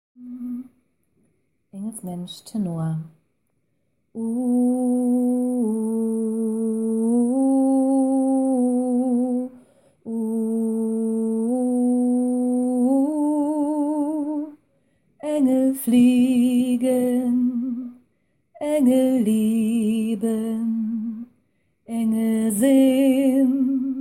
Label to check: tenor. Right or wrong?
Right